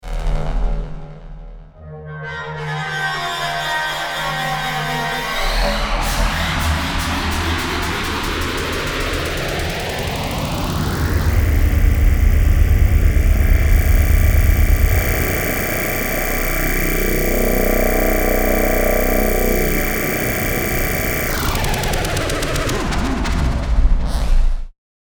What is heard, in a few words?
Swarms